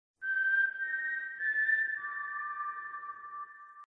suspecious whistle famous game music tone ringtone